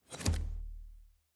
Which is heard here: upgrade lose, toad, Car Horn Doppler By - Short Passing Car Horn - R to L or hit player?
upgrade lose